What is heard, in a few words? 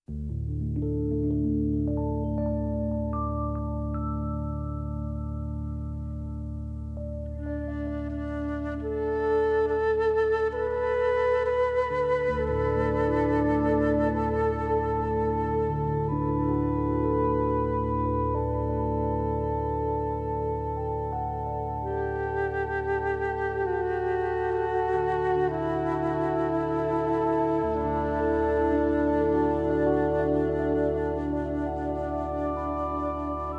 music babies